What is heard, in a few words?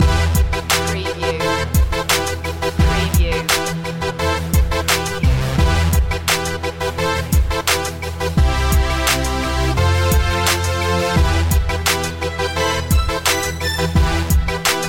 hip parade loop